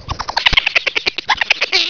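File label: alien laugh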